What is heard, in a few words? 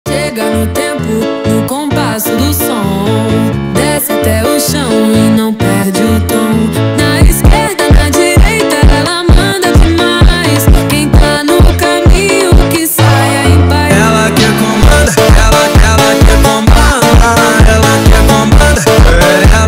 Phonk